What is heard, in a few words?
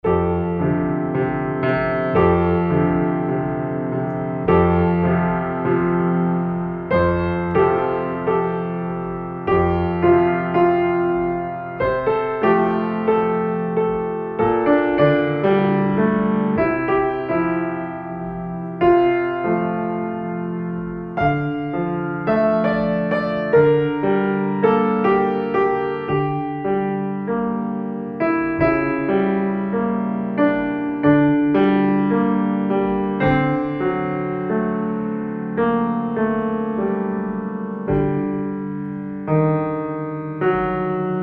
Bells; 2024 Piano and Guitar Pieces Arranged for Piano and Keyboard